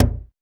UGANDA DRM, UGANDAN

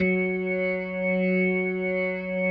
F#3S, B3, Vintage Keyboards

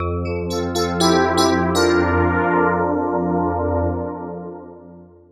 tines n pad; East West; Twisted Textures-BT

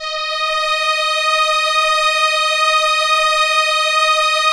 ARP STR ENSL, First Call Vintage Keyboards; SE ENSMBL

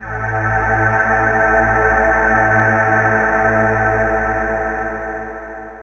A, Drum & Bass Pads; Total Drum&Bass; 90 s Sample CDs

